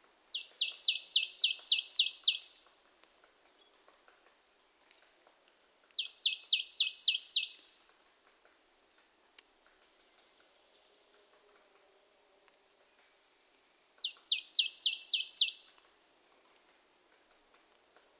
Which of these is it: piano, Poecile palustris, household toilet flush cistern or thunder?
Poecile palustris